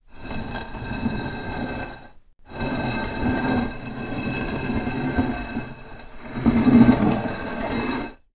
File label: household dish slide scrape work top